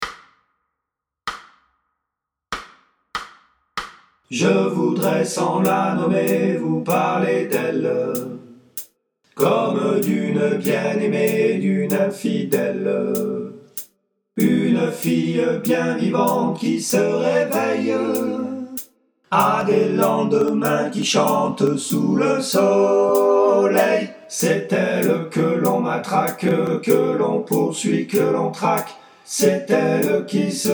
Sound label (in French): Quatre voix, chorale